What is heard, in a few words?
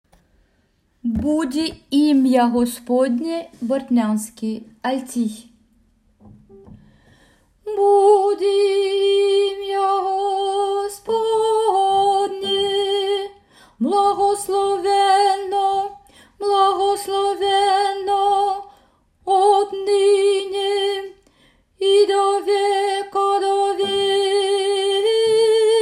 alti, Boudi im'ya gospodné